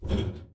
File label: boots metal; Apotheorasis